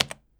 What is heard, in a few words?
Keyboard Key; Keyboard Sounds